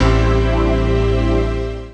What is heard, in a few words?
PAD